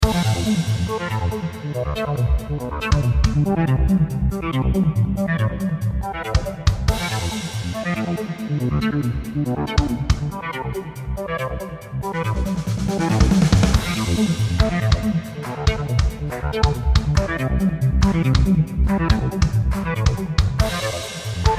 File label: just a drumbeat and synths